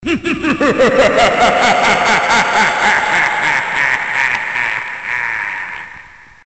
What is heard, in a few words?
Evil laugh